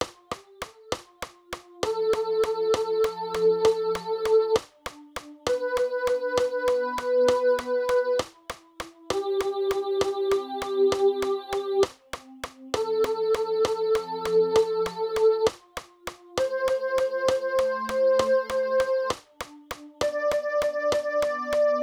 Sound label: Marana Tha soprano; Ordinaires de messes; neufclocherslyon